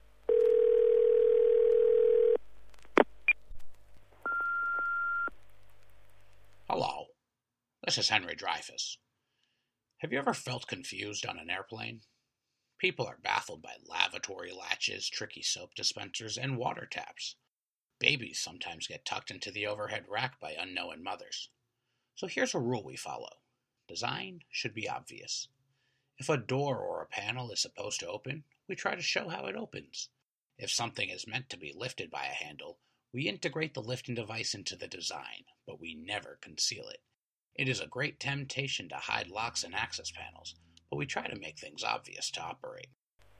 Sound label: Airplane; Dreyfuss